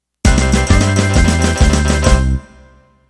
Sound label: Country Shuffle End